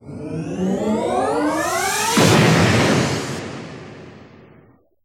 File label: warp speed